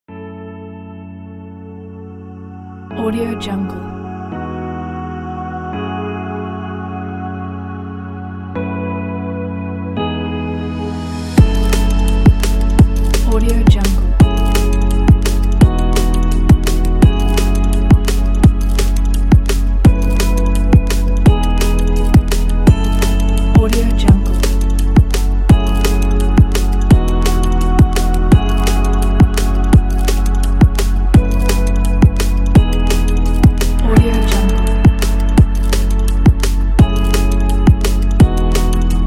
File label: dreamy drum bass